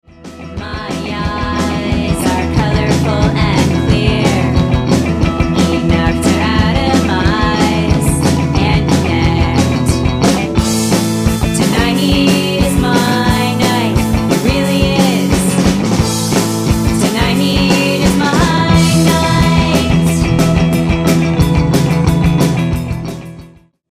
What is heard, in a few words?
drum